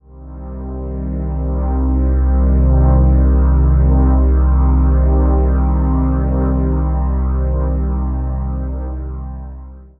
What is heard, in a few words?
ethereal cinematic pad chord cm with long release